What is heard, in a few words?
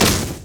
dragons rage hit; LoL; leesin; blindmonk